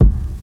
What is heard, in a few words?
clean steel kick drum sample g sharp key, kick drum one shot tuned to the G# note